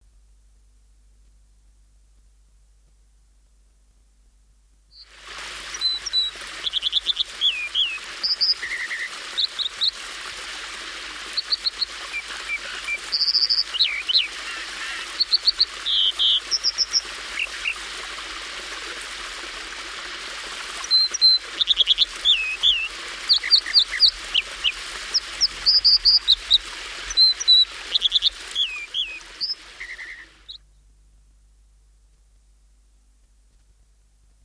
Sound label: dipper, songbirds